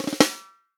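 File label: snare drum, Real Drum Kit; Best Service ProSamples vol.43; POP KIT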